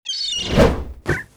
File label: BRUTAL ATTACK